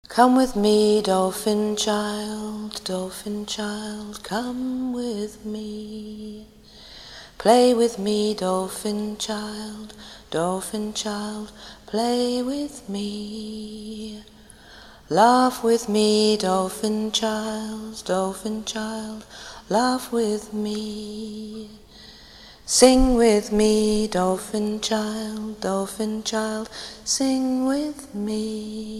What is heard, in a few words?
dolphin; BRIAN FIELD (fieldofsky)